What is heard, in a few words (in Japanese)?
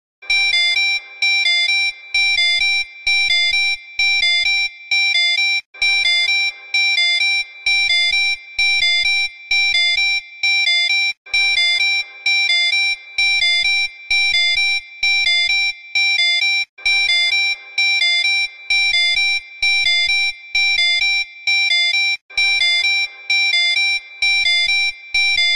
フライヤーアラート音